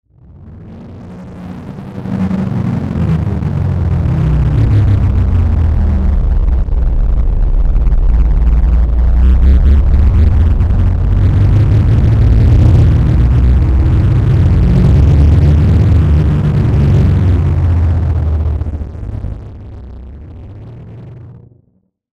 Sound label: Bed Short Descend Ascend Low Dark Fuzz; Bed Sound Effects